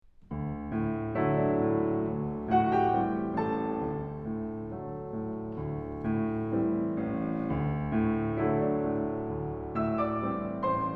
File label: Piano Duet, Andante; TAKLE